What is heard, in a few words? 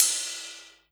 CYMB, Drum & Bass, 135 BPM; Ueberschall; Drum & Bass Resonance